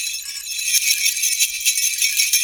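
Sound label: Tanzania bells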